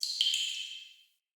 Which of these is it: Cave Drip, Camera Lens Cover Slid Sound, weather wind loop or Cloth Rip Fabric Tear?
Cave Drip